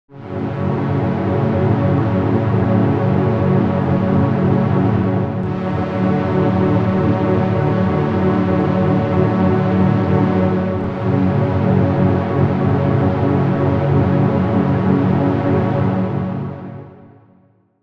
Blues String Swell; Blade Runner

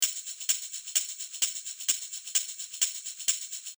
Tamb

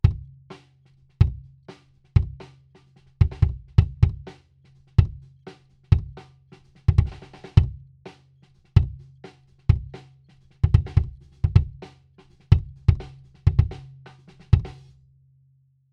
CLOSE KICK

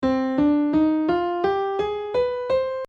c harmonic minor

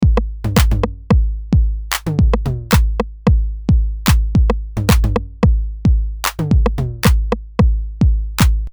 909 Dry

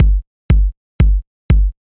kick

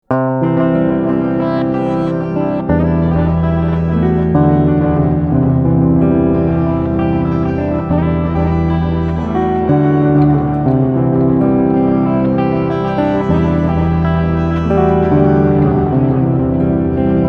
Multi Delay

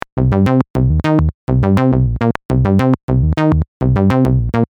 Bass

